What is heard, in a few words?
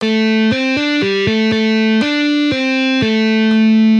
Easy guitar licks